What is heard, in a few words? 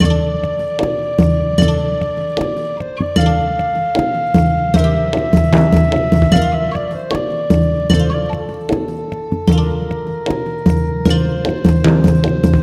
Dialogue